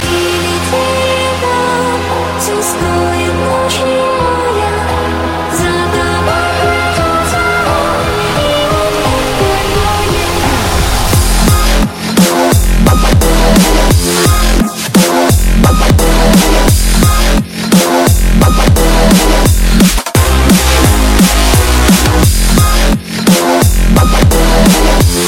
Lullaby

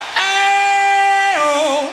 Eooooh; Live Aid

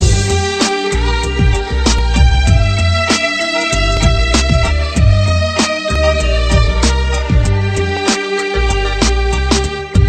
Hip Hop

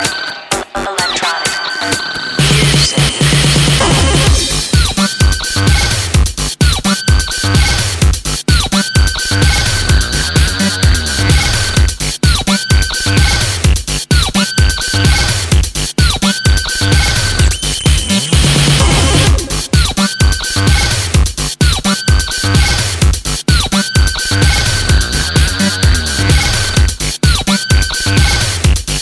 Electro Music

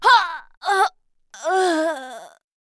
Dying Lady

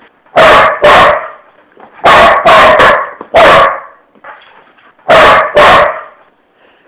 Dog Barking; Jake

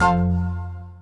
Positive Pluck

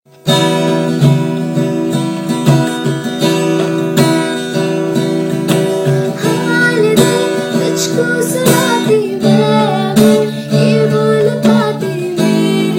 Instrument

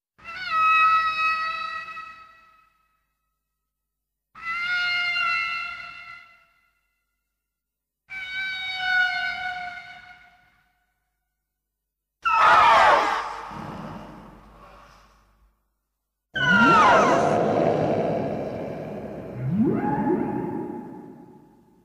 Roar; Biollante

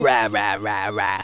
Rawr

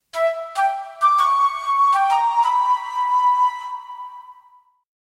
Flute high

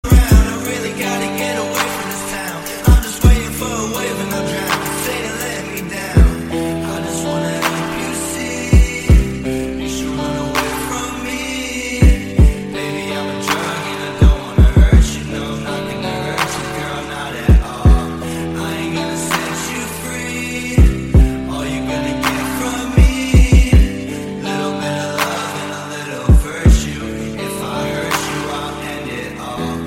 Haunting

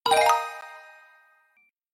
Correct Sound; EF151

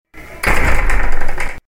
Door Closed

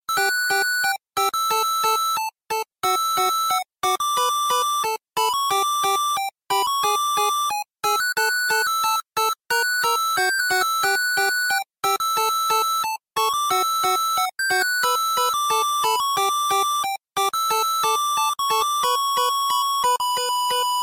8bit

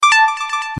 Short Sms Tone

